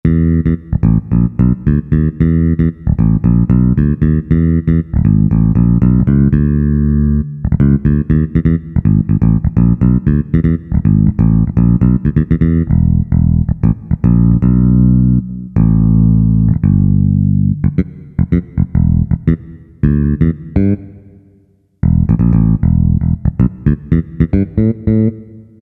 COMP PK PASS, Roland SC-880; Factory Patches

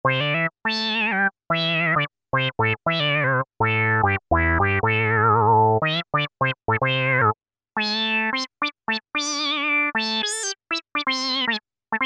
Korg microKORG 70's Funk Lead; Factory Voices

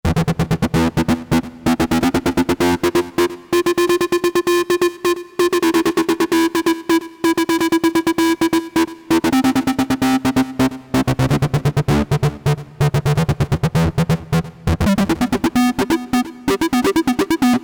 Arp, Novation XioSynth

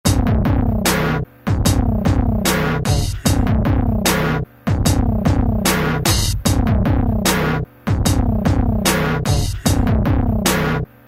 Muddy Hop, Korg ES-1; Factory Patterns

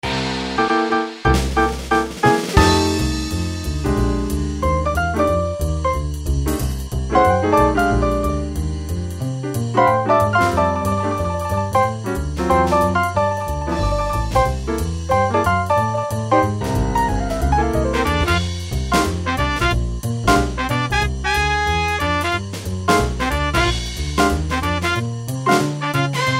Jazz, Roland U-110; Factory Demo Songs

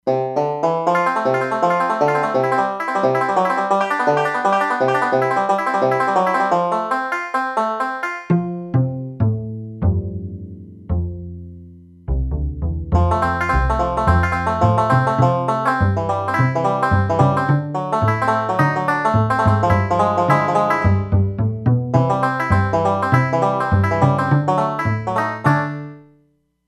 Blue Grass, E-mu Proteus-3; Factory patches

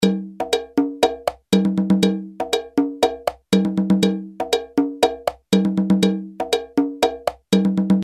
MERENGUE, Roland R-8

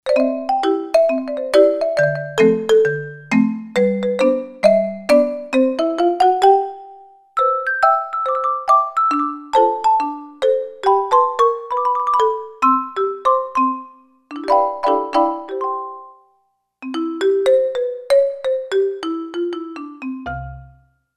Marimba; Roland SC-880; Factory Patches

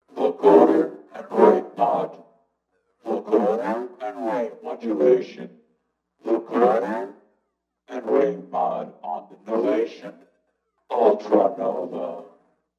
Vocoder Ring Mod; Novation UltraNova; Factory Patches

